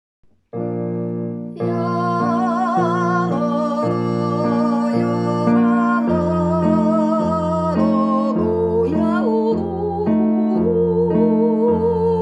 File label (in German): Jodel